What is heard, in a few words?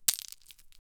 sound of skeleton bones b; sound effect; SFX Engine